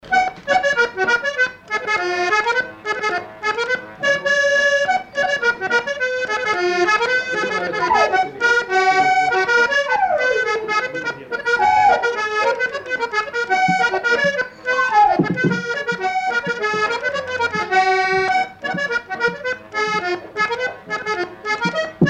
Polka; Polka de Chabassier

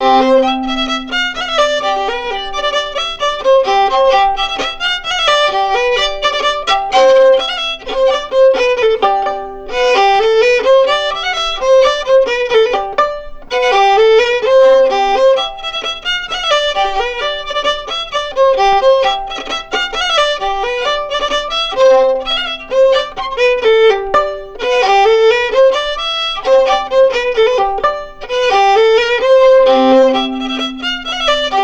Polka piquée

